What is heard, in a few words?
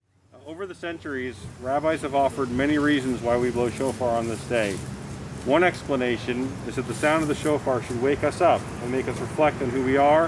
Shofar Collage; COVID Initiative